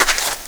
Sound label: SAND, FOOTSTEPS; AKAI S-Series CD-ROM Sound Library VOL-6